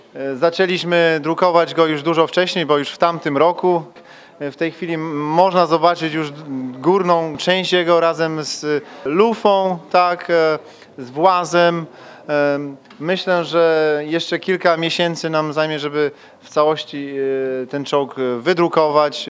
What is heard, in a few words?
techno